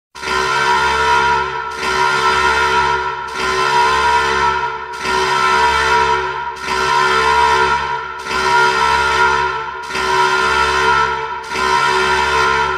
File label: emergency tone